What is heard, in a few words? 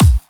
normal hit whistle